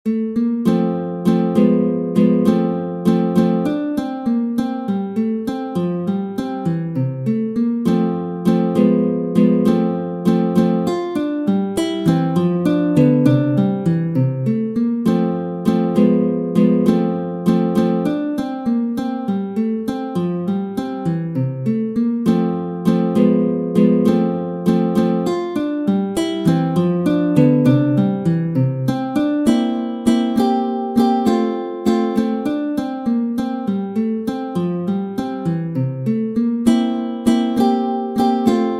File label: Andante, Classical guitar; Ferdinando Carulli; Op. 241 Méthode pour la guitare